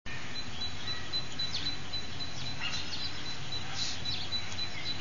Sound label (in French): nature oiseau 5